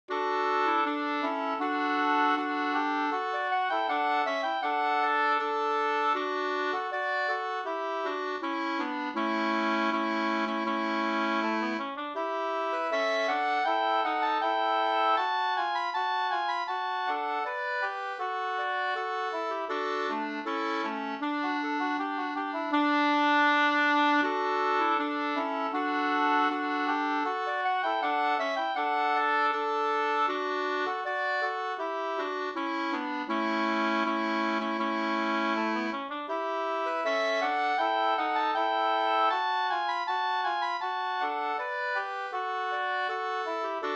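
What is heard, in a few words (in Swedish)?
brudmarsch Flenmo brudmarsch Södermanland 2 stämmor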